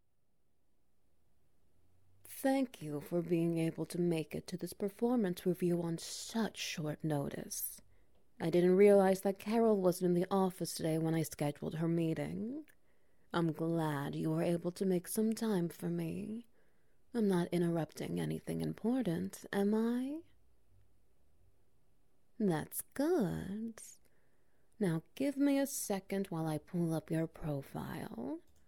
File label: Wet Noises